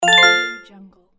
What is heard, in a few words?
successfully